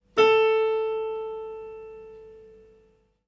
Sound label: Piano